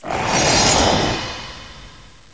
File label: zacian crowned sword, cries; pokeemerald